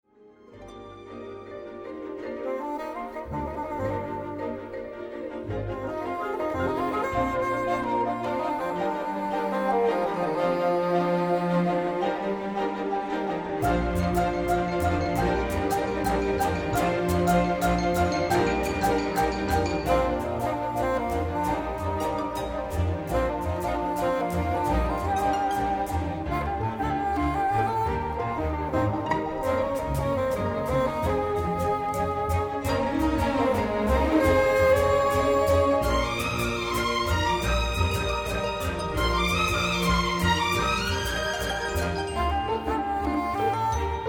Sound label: Bassoon; Bassoon Concerto; Bournemouth Symphony Orchestra; Chandos